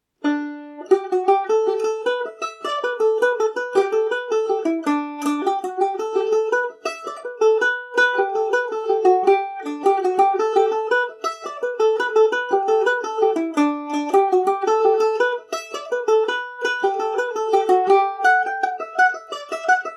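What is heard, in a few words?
donnybrook fair revisited G major, mandolin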